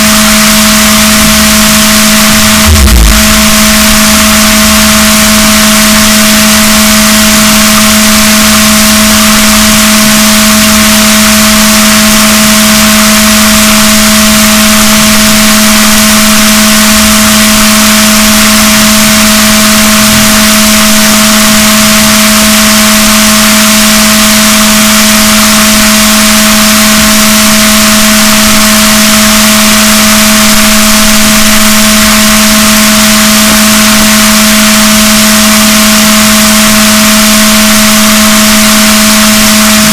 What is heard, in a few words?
rorquals positif, boat, BalaenopteraPhysalus; Chile